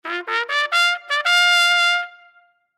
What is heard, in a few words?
Trumpet Charge, Trumpet Charge Cavalry; Brass Sound Effects; Blastwave